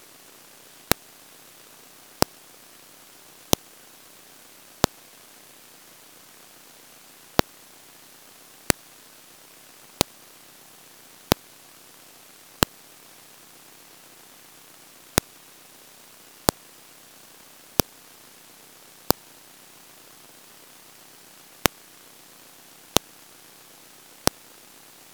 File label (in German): weidezaun, elektrosound